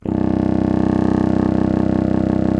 C. Bassoon, solo instruments

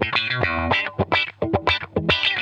Funk Guitar; 90 s Sample CDs; Zero G

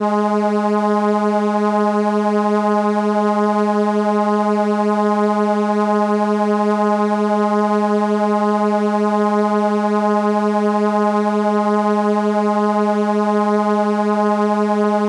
Viola G#4, ARP Solina

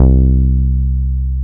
BAS MKS-50, Roland

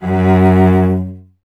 STR VC F#0, Cello; Miroslav Vitous; Solo Instruments VOL-1

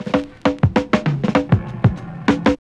Fills, Roland; Sound & Vision - Gigapack I; Perc

